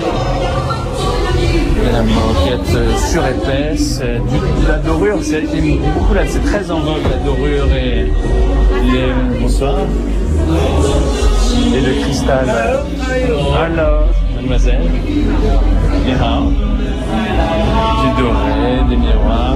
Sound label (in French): Chine karaoke